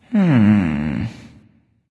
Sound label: sigh; smite; voicepack; ullr